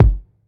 low tone kickdrum sample g key, Royality free steel kick drum sample tuned to the G note; kick drum samples